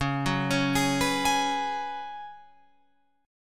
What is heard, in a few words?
C sharp 7th Suspended 4th C sharp, Guitar Open F; C#7sus4; arpeggiated; tuning=Open F; guitar chord